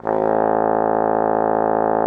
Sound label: TROMBONE; Xsample VOL-3